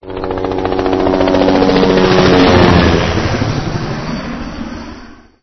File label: Chopper; 暴力赛车